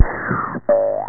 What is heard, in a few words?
thud